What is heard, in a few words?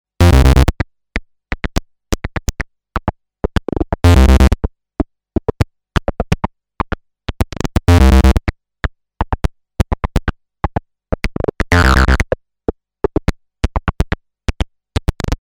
Polivoks pro extra short attack